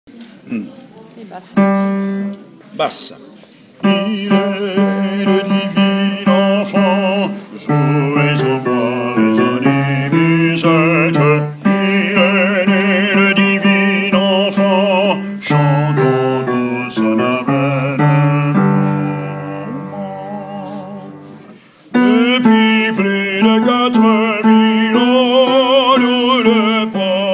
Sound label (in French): basses il est né le divin